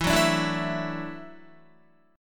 E 7th Suspended 2nd Suspended 4th E down Guitar 4E 4A 5D 5G 5C 6F; strummed